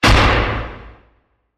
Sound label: Big Gun; SoundsCrate